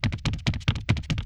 Leave Footsteps Fast; gamerip; Nailsmith

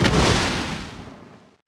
Particle Explosion

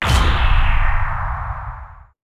Large Sustained Beam; battle suit aces

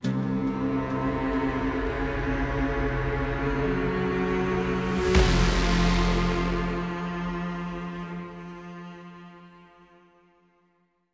Music Intro 140; Cairo

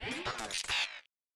Armadillo Fire Charge

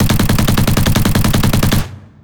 Tank Multi Shoot

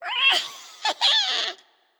Gnome Laugh; Legend of Keepers

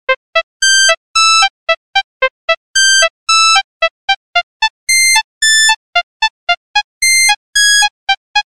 Ring Tone (Mono); Smeshariki: Kaleidoscope of Games

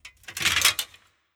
Armour

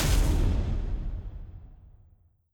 Impact Fight

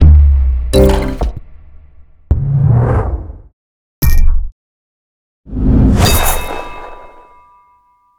UI Menu scorecard trophy; Monster Jam Steel Titans

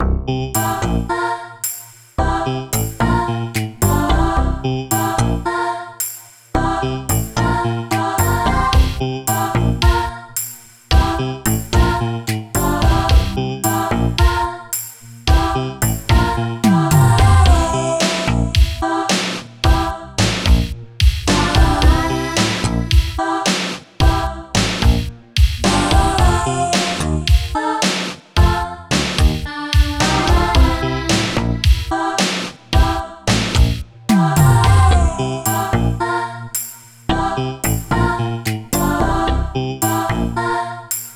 System Menu; Blippo+ System Menu

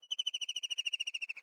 Amb Env Bird Chirp; Botanist; Viewfinder; Xbox Series X/S

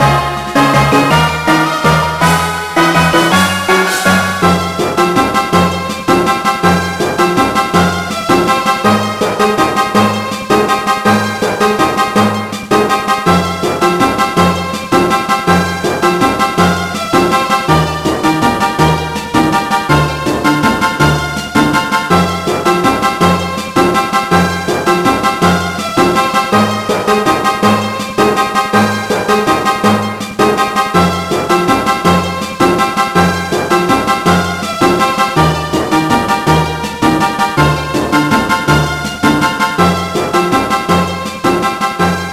Fanfare Battle Clear

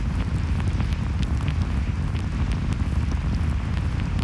Fire Trap Idle; Ion Shift

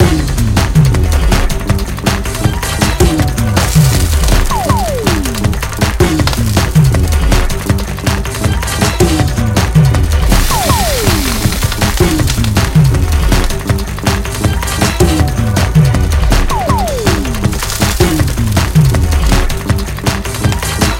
Aftermath Loop; Burnout CRASH!

